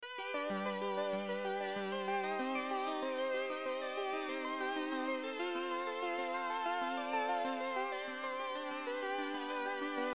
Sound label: adventure synthwave arp; music; looperman; PirateTreasureHunt